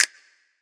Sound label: Snap